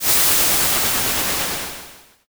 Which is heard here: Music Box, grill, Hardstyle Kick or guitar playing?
grill